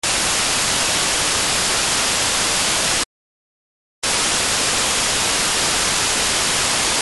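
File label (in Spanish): Ruido Blanco; sensibilidad a frecuencia y amplitud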